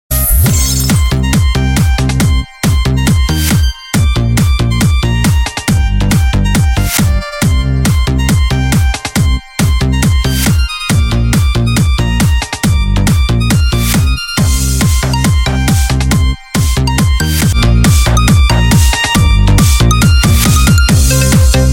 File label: Flute Version Dj Remix; TikTok